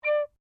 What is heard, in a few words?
RECORDER SHORT, cheap plastic school recorder; Miscellaneous Musical; SoundEffects-Foundation4